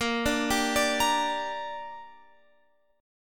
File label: Bb 6th Bb, Guitar Open F; arpeggiated; x,17,14,14,17,17